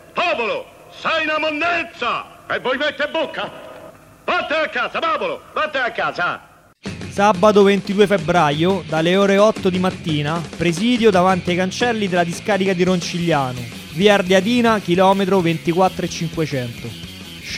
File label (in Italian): jingle; discarica roncigliano